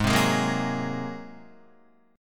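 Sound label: A flat 9th A flat Guitar, chord; Ab9 strummed; JGuitar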